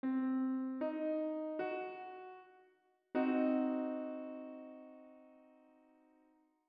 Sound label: Diminished